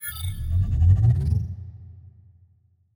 cloaking activation button; Stellaris; first contact

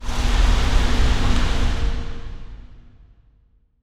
continental planet; ambient; Stellaris

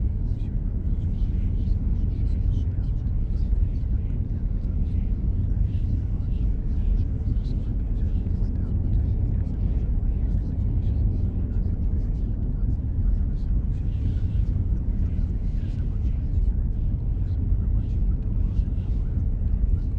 ship idle; beholder; Stellaris; paragon; ships

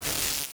sfx research station lightening Small; Stellaris; nemesis

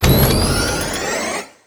building construction complete; Stellaris

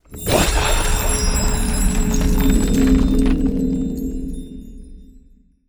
lithoid angelic; lithoid portrait; Stellaris

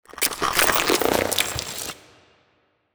head open; biogenesis

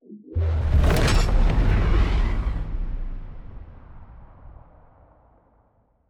ship destroyer move; ships; Stellaris; shroud

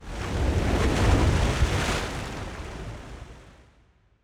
ocean planet; ambient; Stellaris

